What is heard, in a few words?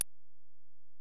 EPerc, Alesis DM-PRO